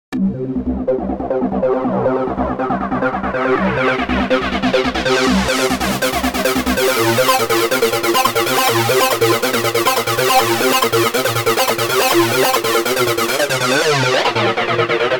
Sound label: Acid Lead, Korg